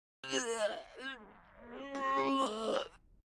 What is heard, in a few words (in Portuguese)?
Vomito